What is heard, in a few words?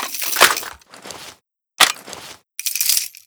vickers reload; emplacement